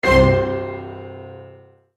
Impact Piano And Strings; Miscellaneous Impact Sound Effects

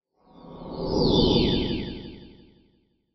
Sci Fi Spaceship; Spaceship Fly By Resonance High; Spacecraft

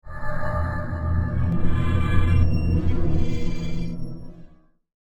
Alien Experiment Chamber; Alien Sound Effects; science fiction sound effect; star wars / trek style games; Andrew Fleming

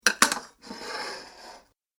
Saucers Stacked Then Plates Slide, dishware

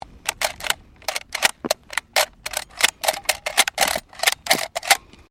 RIFLE 303 MK1 MAGAZINE UNLOAD ROUNDS

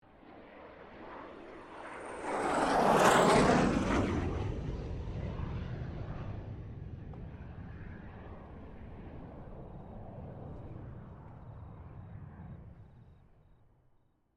AIRCRAFT FA-18 HORNET PASS

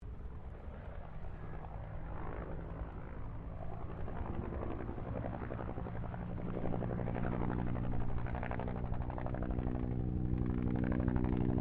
HELICOPTER 1967 BELL UH1 IROQUIOS PASS

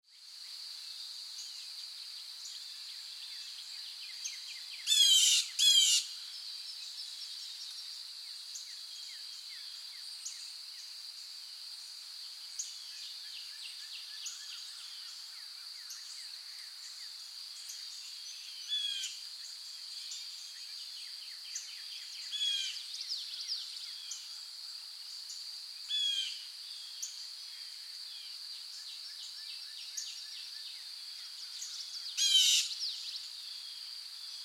Ambience Forest Morning Birds Insects; Forests and Jungles

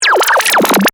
Radio Stinger Data, Stream, Reverse, Beeps; Production Element, Imaging Element, Accent, Transition

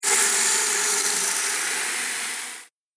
Static; Ghost Sound Effects; Horror Sound Effect

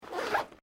Backpack Nylon Small Zip Close; common day pack; Zipper

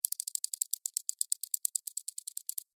Insect Walk Move, Insect, Walk, Movement, Bug; Blastwave; Animals:Insects

